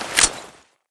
p90 draw; garrysmod; p90cstm

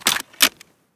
m60 cover up; garrysmod

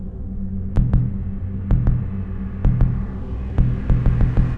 beat; garrysmod

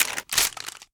beretta m92 clip in; beretta92; garrysmod

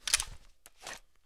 magout, weapons pistol deserteagle; garrysmod

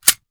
m9 slide stop, weapons pistol beretta92fs; garrysmod

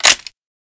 mp412 close; garrysmod

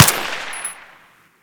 bizon; garrysmod

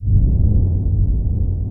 beat; garrysmod

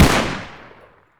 sako fire; sako92; garrysmod

